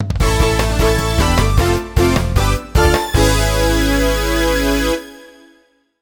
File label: Mini Game Win; super monkey ball adventure